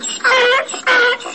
skripuchij zvuk krika osla

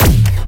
mezhgallakticheskoe napadenie s pomoshyu lazernogo oruzhiya

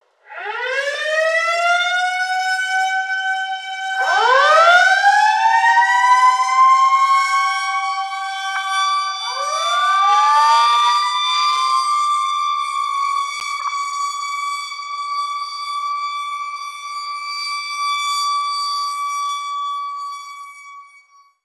sireni neskolkih pozharnih mashin

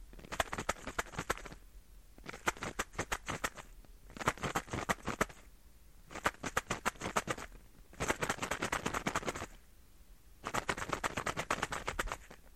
tabletki gremyat vo flakone

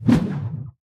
negromkij korotkij bistrij zamah

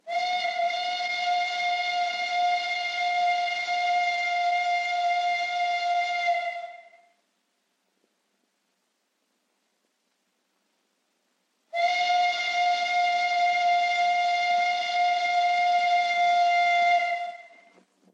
dlinnij svistok anglijskogo poezda

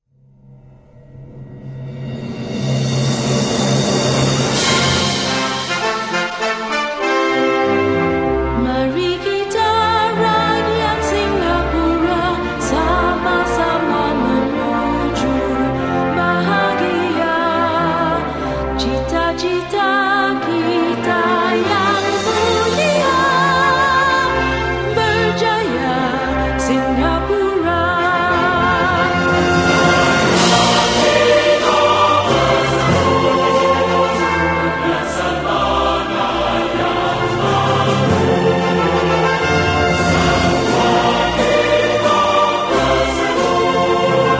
gimn singapura

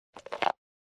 ukusite tverdij material

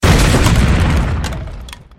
zvukovoj effekt razbivaniya korobok

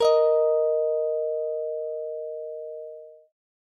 gitarnie melodii struna d s garmonajzerom

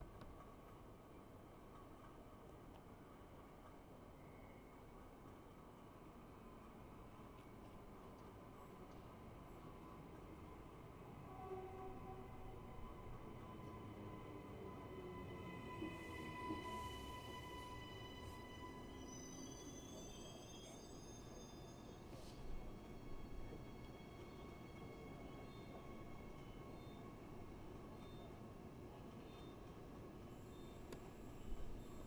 pribitie poezda zapisano na platforme

